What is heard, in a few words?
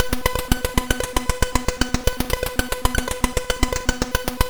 Commodore 64 Music, MOS6581R2